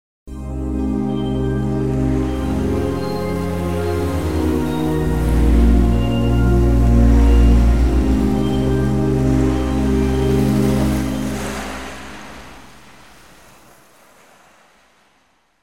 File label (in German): Atmo Synthie im Hallenbad